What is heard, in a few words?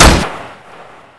ump45